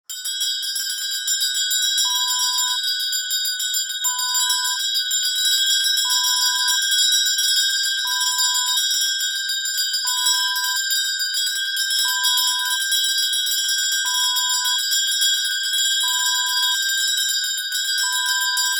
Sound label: handbells